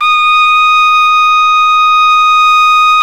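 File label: BRA TRUMPE, trumpet, brass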